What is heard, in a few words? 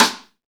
snare, Roland